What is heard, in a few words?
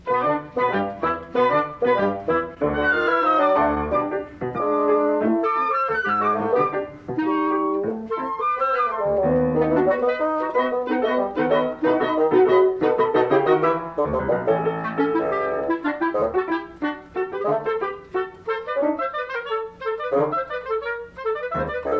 Chamber Music